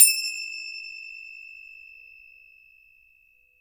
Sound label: FINGER CY L, Synclavier Percussion; ILIO; Synclavier Percussion 2; World & Orchestral